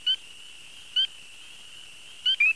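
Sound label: spring peeper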